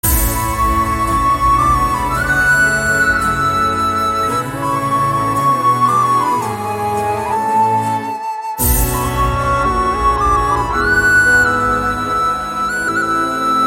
Flute

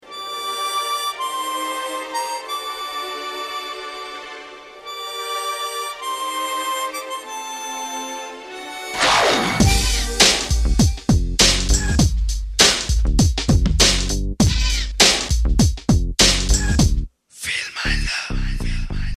Music Beat Ringtone

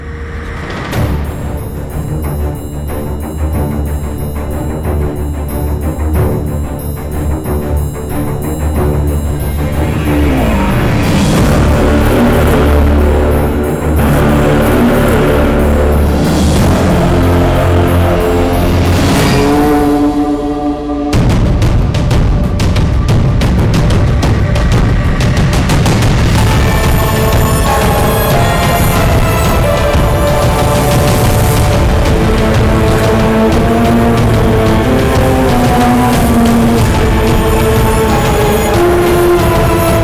Interval BGM

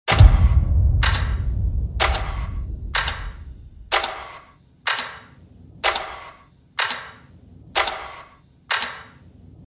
Trailer Titles BGM Ringtone; Batla House

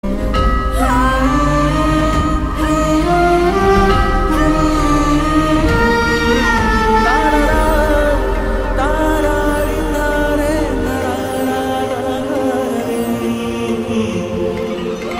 humming; BANARAS MOTION POSTER

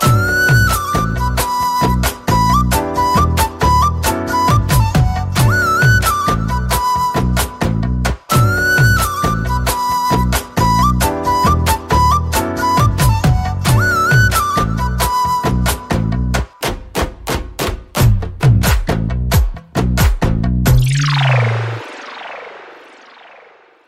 Love Bgm Ringtone; Kanaa

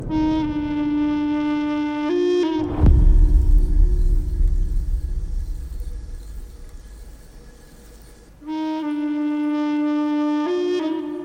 Malayalam Bgm; JGM; Jana Gana Mana

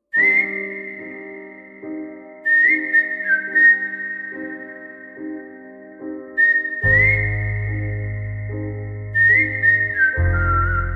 Whistle; Bachelor

